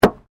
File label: Car Ford Focus Fuel Cover Close; Ford Focus hire car; Cars (Sound Effects:Vehicles)